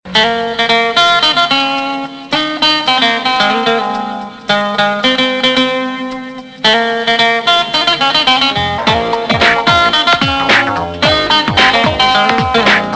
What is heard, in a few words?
Guitar india